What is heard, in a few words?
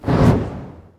ball fire; hexagon glitch 3